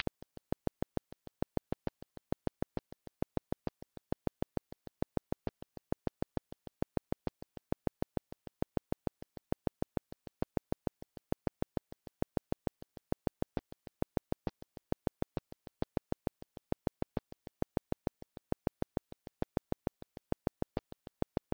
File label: ultrasound